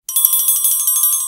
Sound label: music orchestra bell, notifications; Samsung GT i7680